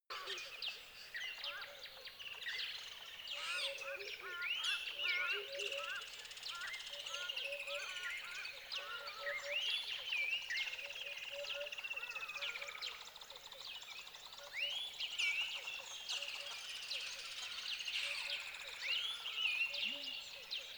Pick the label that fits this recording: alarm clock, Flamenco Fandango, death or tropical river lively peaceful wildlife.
tropical river lively peaceful wildlife